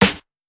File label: Clap Snare; Roland MV8800; Dirt&GrimeKit